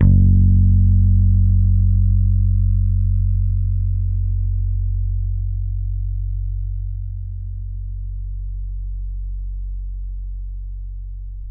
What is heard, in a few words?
T-BIRD BASS PICK F#1; Bass Mace; DirectWave